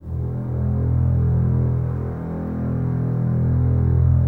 Basses E 1, String Ensembles; Miroslav Vitous; 90 s Sample CDs

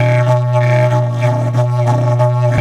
Didgeridoo; DIDGEHORN2

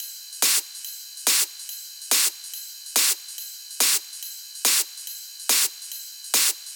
PWM Kit 142 BPM Loop, Drums & Perc; Vengeance - Essential Clubsounds Vol.5; VEC5 Demo Constr Kits; Root Gmin

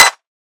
claps, dance drum kit

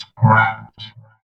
VOX VOX, Electro Vox

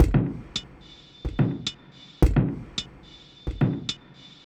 Drum Loops Minimal; Zero-G Smoke

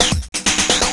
Fill, Drum N Bass Percussion Fills n Breaks

